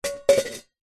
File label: household teapot old metal set down stone surface; zapsplat